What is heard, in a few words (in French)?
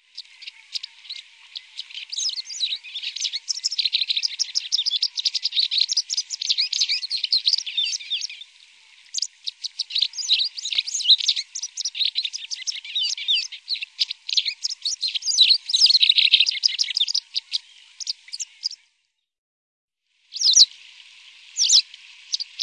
Bergeronnette grise, birds